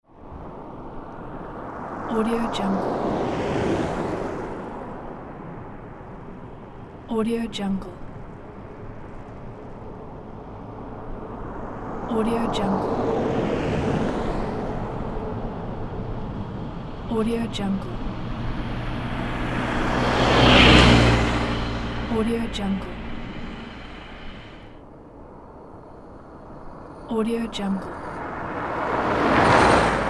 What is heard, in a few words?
cars and other vehicles passing by on a snowy road